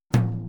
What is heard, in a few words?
Boogaloo - Tom Rack, 82 BPM; Drums; Intro; Sonic Foundry; Drums from the Big Room The Mixes